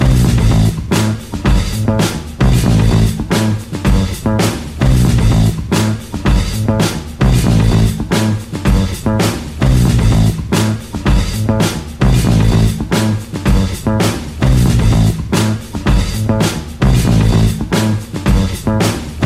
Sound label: Crunge Bass Loop